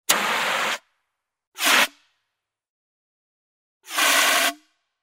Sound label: Fire extinguisher